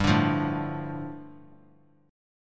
G 7th Suspended 2nd; strummed; JGuitar

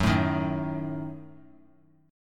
F sharp Minor 6th F sharp; strummed; JGuitar

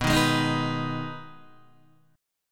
B 6th B down Guitar Open D

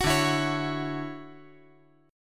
D-Major 9th D down

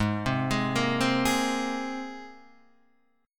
Ab Major Add 9th Ab down Guitar DADGAD; Abadd9 arpeggiated; JGuitar

